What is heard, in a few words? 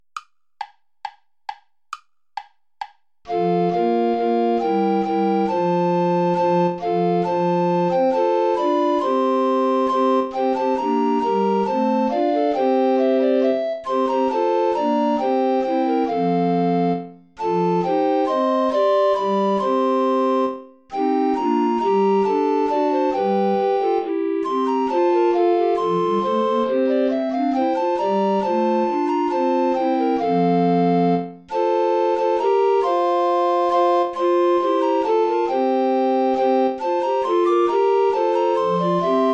two dances play along without alto recorder; morley thomas